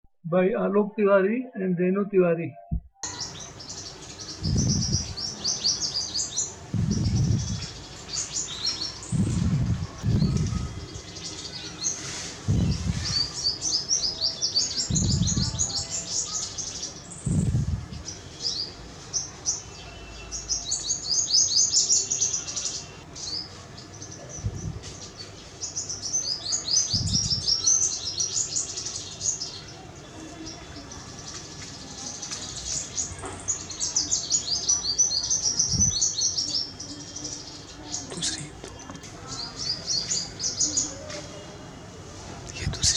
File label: Purple sunbird; alok